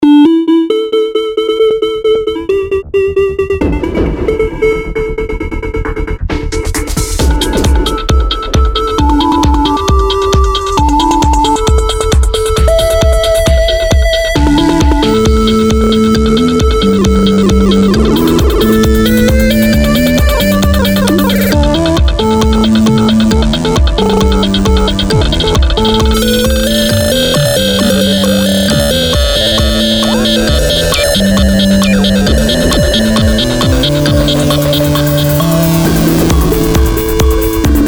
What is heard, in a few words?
shared melody iterations; weeklybeats 2014